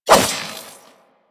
Nikana Swing B; Revenant

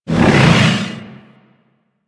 Loot Room Elevator Stop; Ambience; Warframe; Orokin Moon

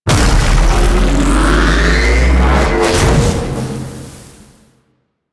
Void Dragon Body Ring Cast; Enemies; Abilities; Warframe; Duviri

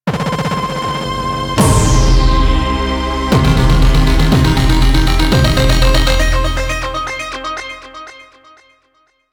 Fishing Success, Music; Venus; Landscape

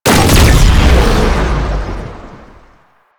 Corpus Projectile Shot; JadeShadows; Ascension

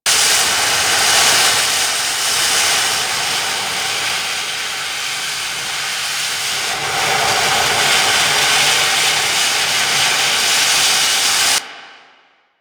Exhaust Vent Loop; Ambience; Props; Loops; Warframe; Venus Open World; Venus Landscape

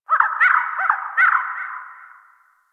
Bird Distant; Ambience; One Offs; Warframe; Eidolon Plains

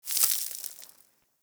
Settlement Sand Fine Debris; Ambience; Warframe; Grineer Settlement

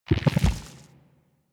Nunchuck Pulse, Weapons; Warframe; Infested

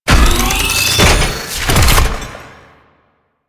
Necramech Ranged Spawn; Enemies; Warframe

